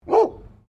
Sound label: Animal Dog Labrador Bark; Various domestic dogs; Dogs (Sound Effects:Animals)